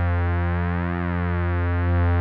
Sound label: PRO LEAD, SYNTHS; Kid Nepro; Elite